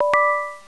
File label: message